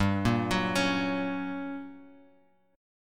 G Minor Major 7th Flat 5th, G, down, Guitar, Open F; GmM7b5; arpeggiated